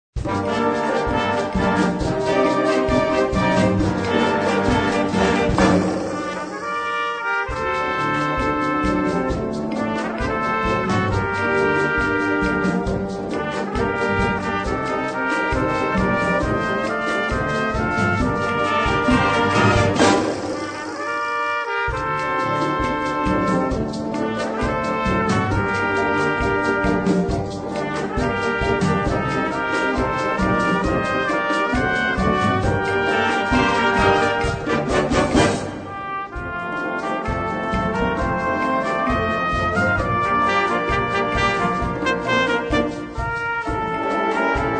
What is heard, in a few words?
Trumpet Fiesta